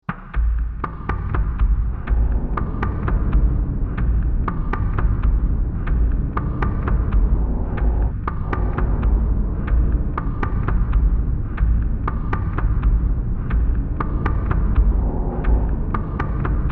Production, Element, Bed, Drums, Exploration, Loop; Bed Sound Effects